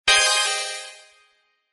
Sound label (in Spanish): alerta whatsapp